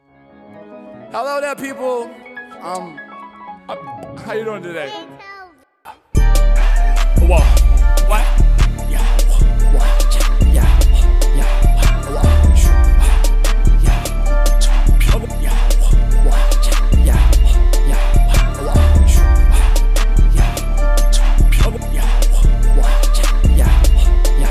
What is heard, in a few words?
Instrumental